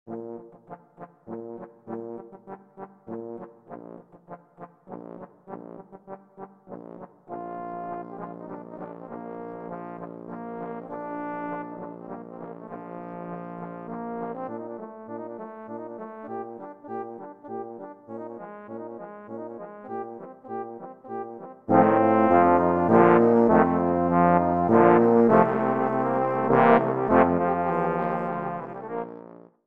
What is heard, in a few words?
Spanish Dance